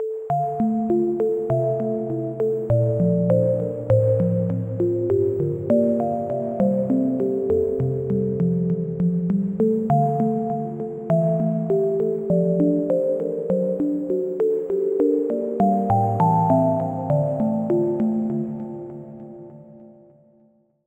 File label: Key, Sylenth1; Routed Sound; Sylenth1 Expansion - Story Walker Vol.1